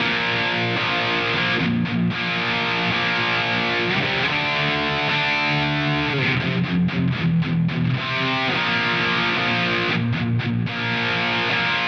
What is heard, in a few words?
Vox Gt off